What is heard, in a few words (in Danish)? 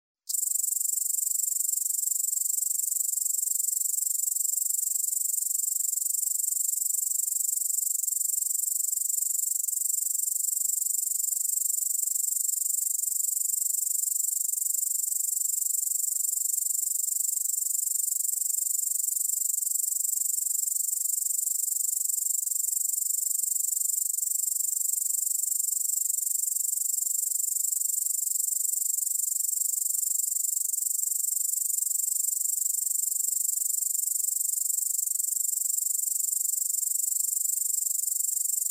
syngende løvgræshoppe, Syngende løvgræshoppe (Tettigonia cantans); I køligt vejr (under 17 grader)